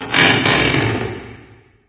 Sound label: amb wood creak, ambient organic; video games; source engine; portal2